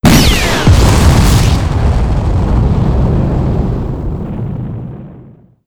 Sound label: blastoff